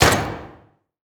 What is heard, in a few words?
door metal sliding close end; Rust